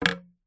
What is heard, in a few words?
household wooden spoon set down in metal pan soup